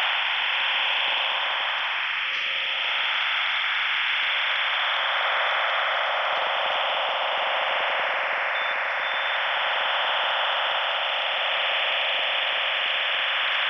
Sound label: OFDM 128 tones on 14277 kHz